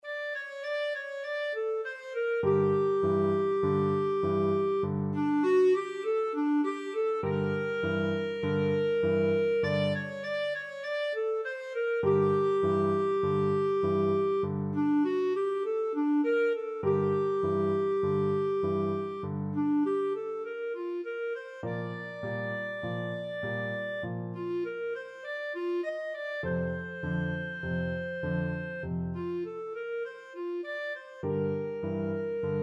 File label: elise boogie, clarinet